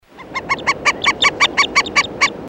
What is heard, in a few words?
Black Necked Stilt